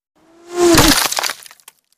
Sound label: Bullet Impacts Wood I